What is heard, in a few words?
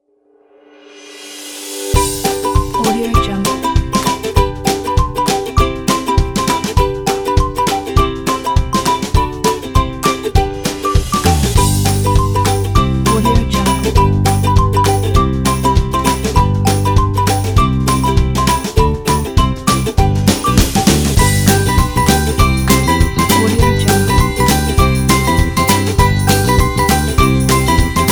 happy kids